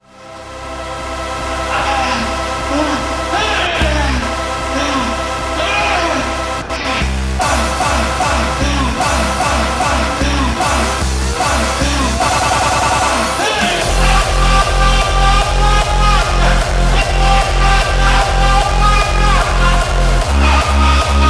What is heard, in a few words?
NEW HARDSTYLE BANGER